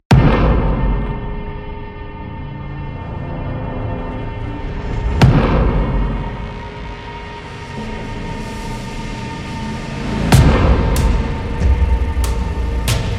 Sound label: Evil